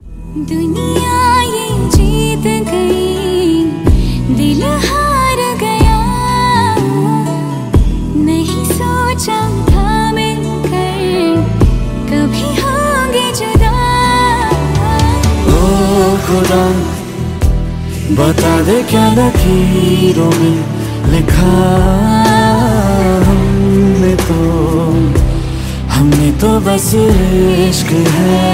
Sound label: Female version Ringtone